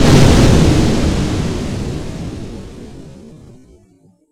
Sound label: Explosion; Rei Yumesaki